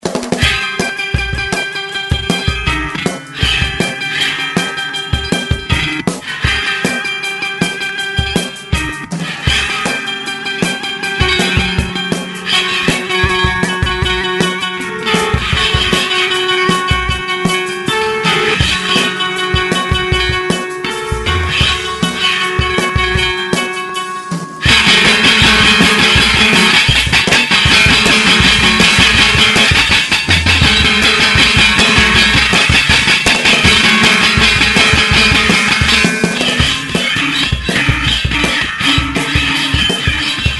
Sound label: moodswinger